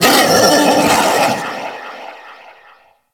Feral Vocal Call; Warframe; Kubrow

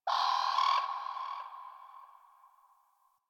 Birds Medium, Ambience, Plains; One Offs; Warframe; Eidolon Plains; Eidolon Landscape

